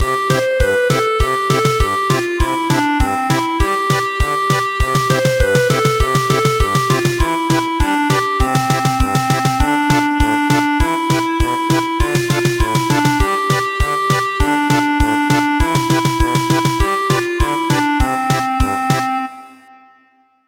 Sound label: German Polka; Two Roosters